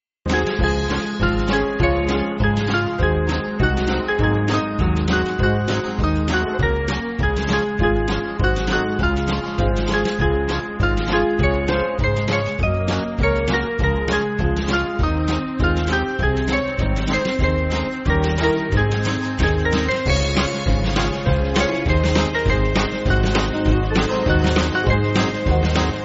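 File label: Band, Backing Files for Churches; Nor Silver Nor Gold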